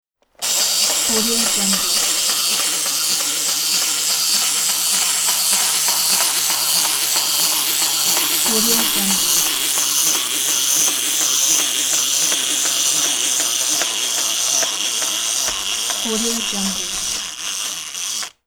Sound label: wind up spring toy walking; electronplanet